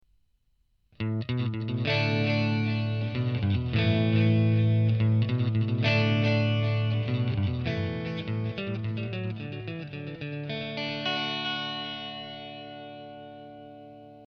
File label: clean delay, guitare